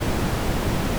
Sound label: mute brown noise